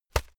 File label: hit plastic fleshy hard